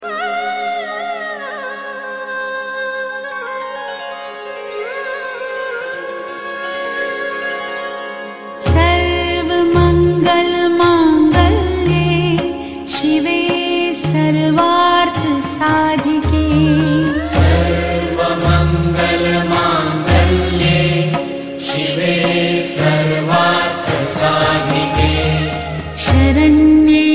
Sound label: mantra